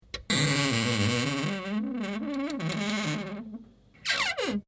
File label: Wardrobe Door Creaking, Creaking of a wooden wardrobe door.; Closet Door Sound Effects